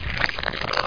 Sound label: squlch